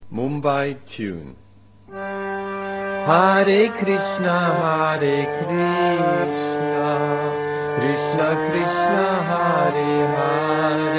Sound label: tune; mumbai